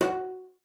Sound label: CELLO FINGER F#4; Xsample VOL-5